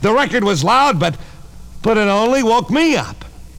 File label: VOX GENERAL; Megabass Remix! 2